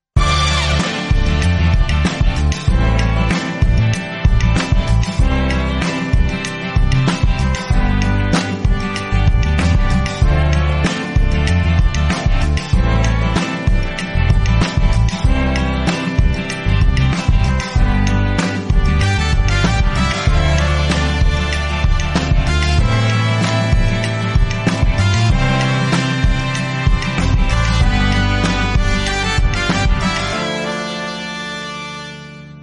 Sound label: Instrumental